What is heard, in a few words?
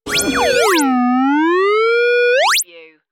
tonal transmission interference; radio; AM / FM tuning, static radio sounds